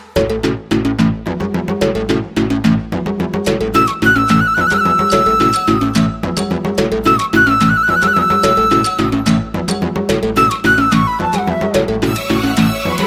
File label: BG Flute Tone, Telugu